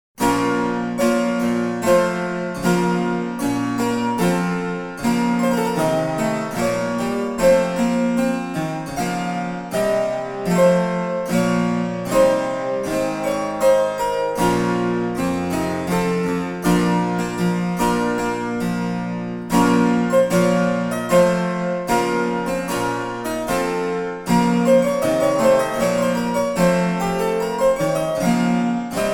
German Harpsichord Tutti; German Harpsichord 1741; Tutti Demo; Excerpt from The Galliarde to the Firste Pavian by William By; edition organeum

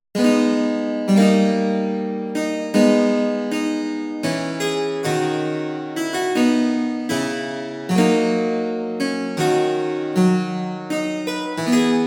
Dutch Harpsichord 2x8; Sarabande; Froberger

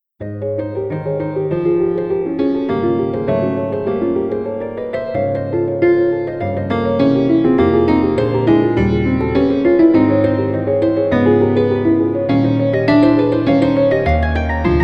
Swiss Grand 1860; realsamples; Steffen Fahl

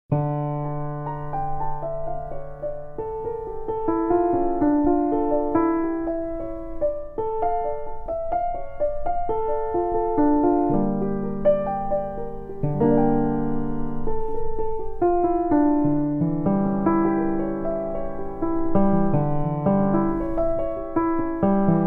Lutheal Piano, Piano; Haendel; Praeludium; edition mim

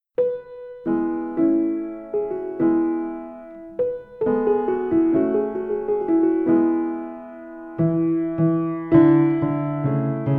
Swiss Grand; JCF Bach

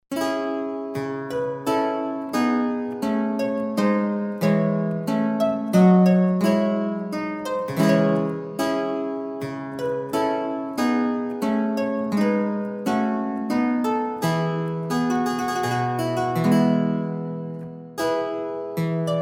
Dutch Harpsichord Lautenzug; Sarabande; Krieger

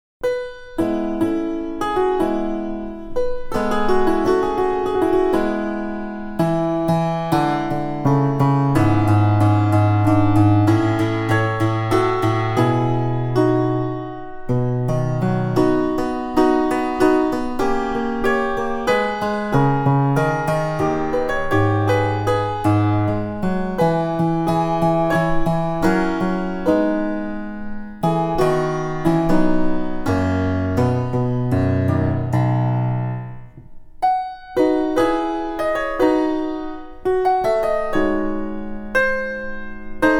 Lutheal Piano, Clavecin Stop, Larghetto; Bach; edition mim

